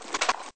spas12 reload start, weapons; sci arms

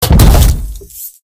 step; goliath

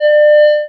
bell join

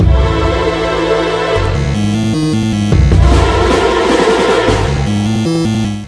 dungeon

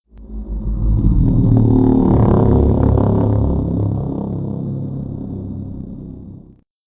breathe, cthulhu; sven; npcs

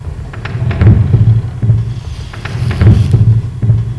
rack move

